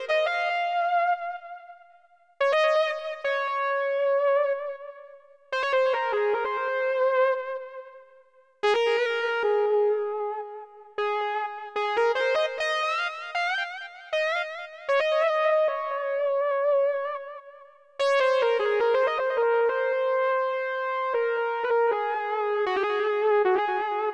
lead synth

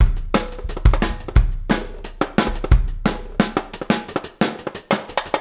jungle; Bonham

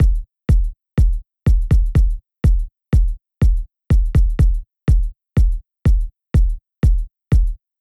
123 Kick

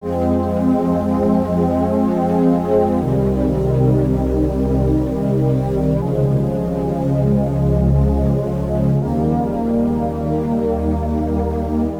pad F min; cherrybomb

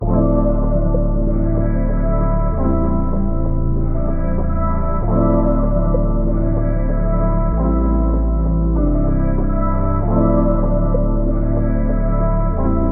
DIGITAL 96 Fmaj

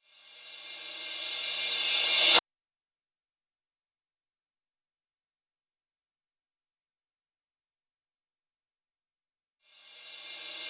100 Reverse Crash

